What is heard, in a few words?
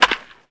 bat hard; Hardball 6